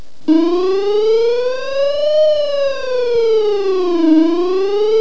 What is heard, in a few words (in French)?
alerte